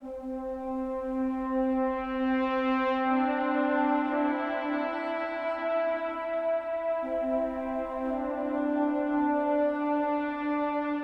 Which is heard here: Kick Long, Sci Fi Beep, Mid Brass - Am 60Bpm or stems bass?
Mid Brass - Am 60Bpm